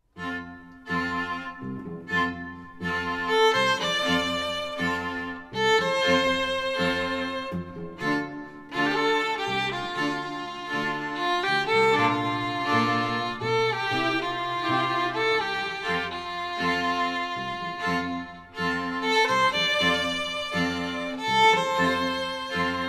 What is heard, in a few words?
String Quartet; Dallas